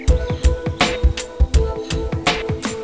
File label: trip loop